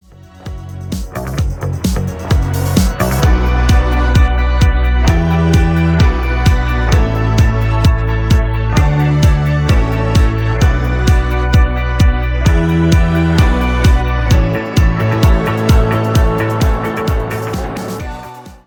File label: Karaoke Instrumental